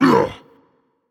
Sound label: grunt hit, dragon; fafnir